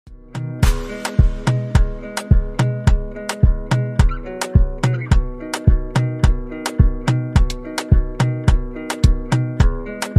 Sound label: instrumental ringtone